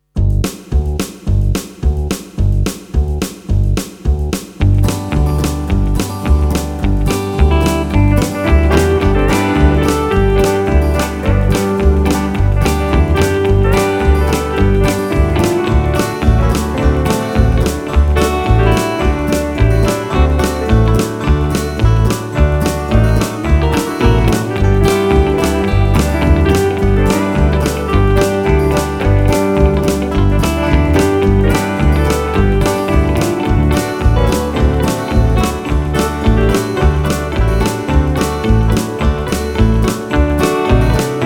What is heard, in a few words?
Banjo Cowboy